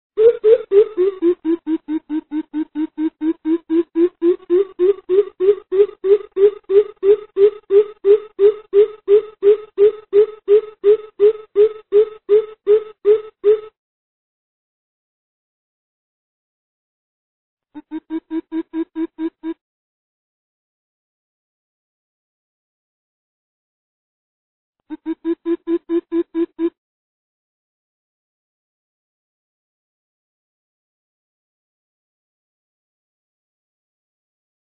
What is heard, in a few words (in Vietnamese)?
Tieng chim bim bip moi chuan goi bay